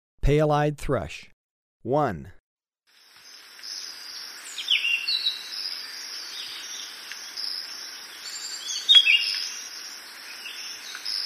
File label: Pale-eyed Thrush, Birds of Ecuador; S. America